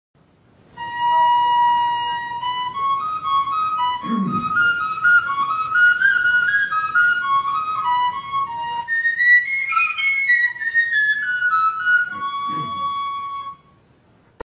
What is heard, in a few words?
flute